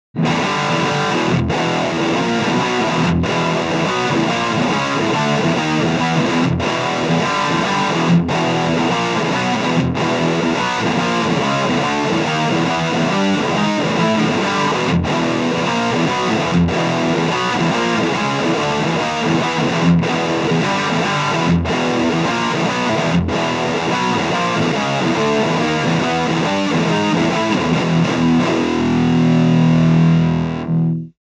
Crush 35RT Metal Riff Double Track

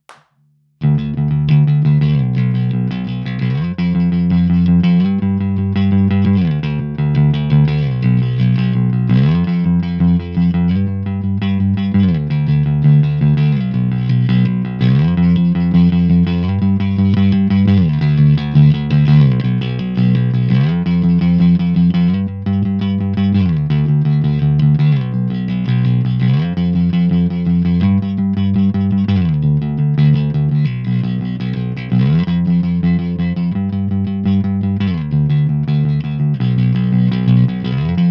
Crush 25 Punk

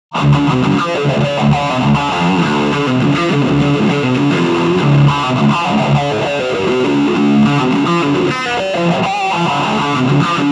Crush 35RT Heavy Riff Phaser Delay In FX Loop

